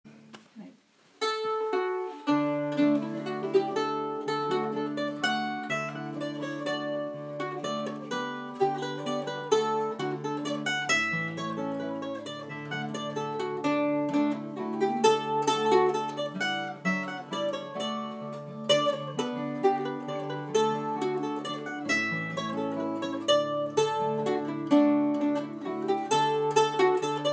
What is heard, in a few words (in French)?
Valse; Valse efter Mannenberg